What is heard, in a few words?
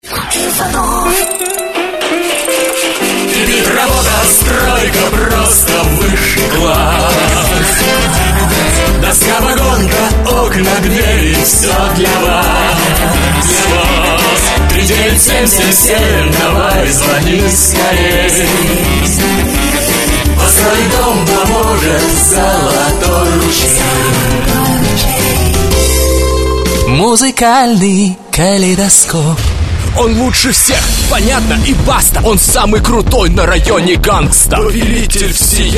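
vocal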